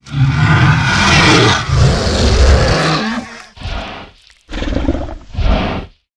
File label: dragon death